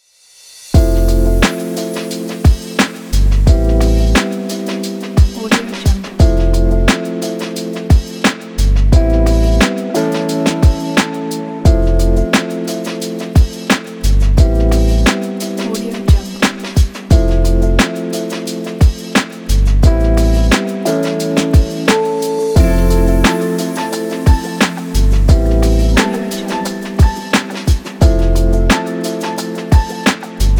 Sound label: hip hop